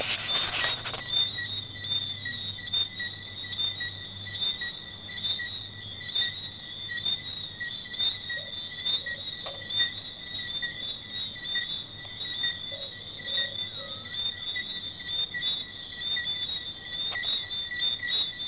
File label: treefrogs